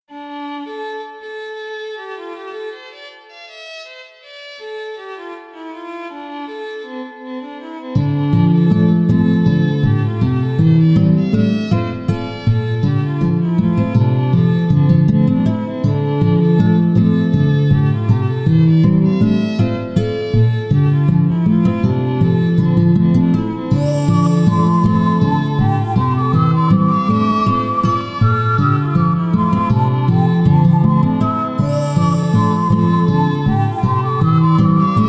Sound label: celtic whisper 432 hz